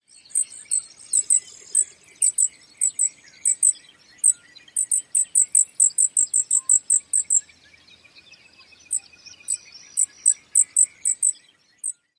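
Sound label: Lark Bunting, buzz calls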